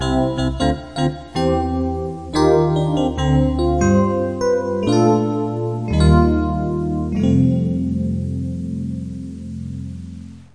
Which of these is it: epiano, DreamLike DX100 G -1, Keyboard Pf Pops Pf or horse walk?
epiano